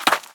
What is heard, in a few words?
land ice; Scrat